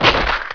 splat

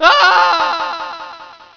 Ahhh; NS-SHAFT 1.2

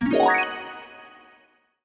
Sound open

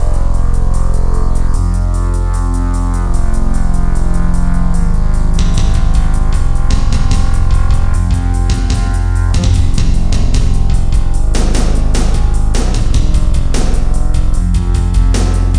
MENU MONO; PC Gamer IT CD 35

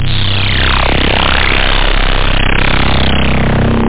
303 frequence tuning; tb303

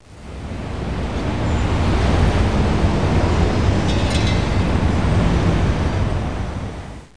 amb

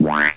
NEGATIVE NOISE

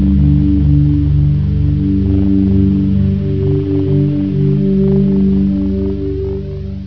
ominous

